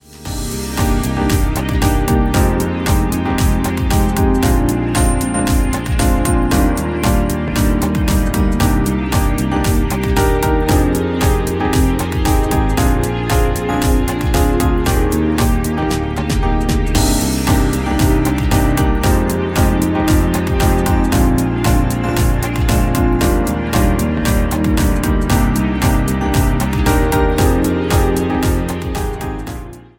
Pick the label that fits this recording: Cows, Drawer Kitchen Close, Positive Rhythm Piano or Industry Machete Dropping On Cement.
Positive Rhythm Piano